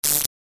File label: Electricity zap thin crackle